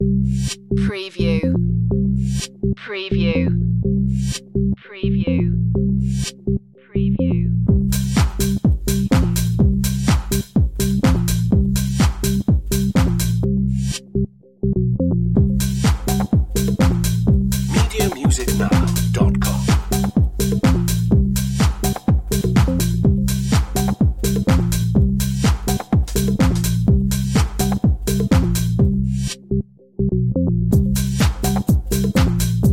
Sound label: organic house